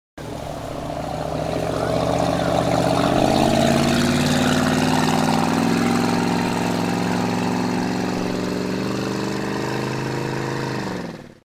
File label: Boat Motor